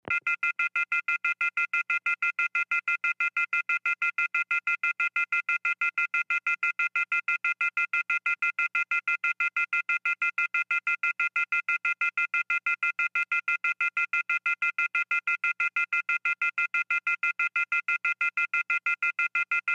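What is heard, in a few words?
Telephone Off Hook Signal; futz off-the-hook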